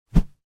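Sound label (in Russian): очень быстрое исчезновение; Короткие звуки для монтажа — для transition, движения, смены кадра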